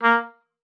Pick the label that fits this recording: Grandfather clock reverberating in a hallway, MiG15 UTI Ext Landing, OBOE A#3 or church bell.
OBOE A#3